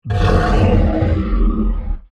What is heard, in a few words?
Monster Growl, Horror, Monster, Growl, Creature; Monsters; BlastWave